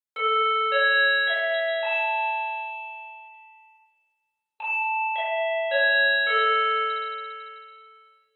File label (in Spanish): Tonos de llamada para megafonías e instalaciones